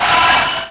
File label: Watcher Die, Aliens